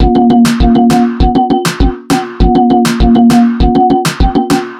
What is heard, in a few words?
Reggaeton Bridge